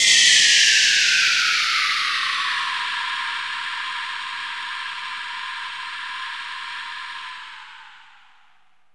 SYNTH FALL

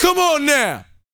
VOCAL, R&B Dance, HIP-HOP; Spectrasonics Vocal Planet

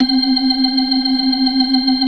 FAST VIB, ORGAN, TREMOLO VIB

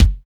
ORDER KICK; Drums In Your FACE!

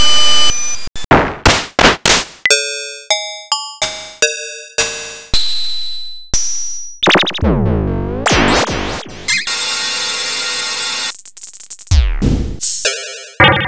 Yamaha DX7

